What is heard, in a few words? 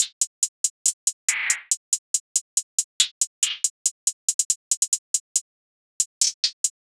Hihat Loop 140 BPM; Diablo; Hip Hop